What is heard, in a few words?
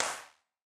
Wider Clap